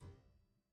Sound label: Harpsichord, French, Releases, C1; Chordophones